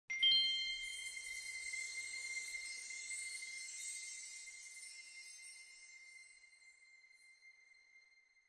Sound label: feature Splash Start; lost relics